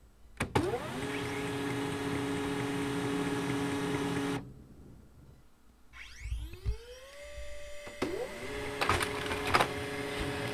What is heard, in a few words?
small office printer - taking paper from adf